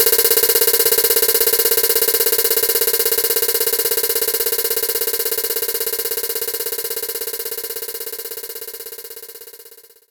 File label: Hi Hat Open Attack; knights try